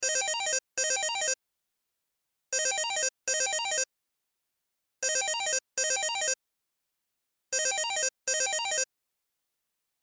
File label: Cell Ringtone; Loop; Cell Phones; Blastwave